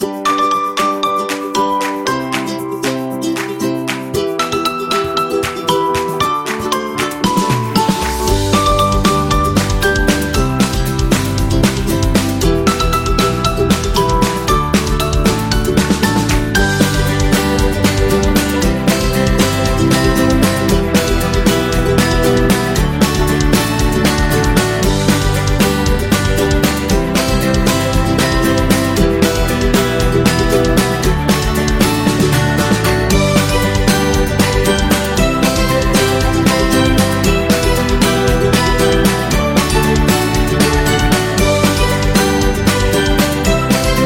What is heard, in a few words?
Acoustic Happy